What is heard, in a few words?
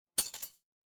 glass shatter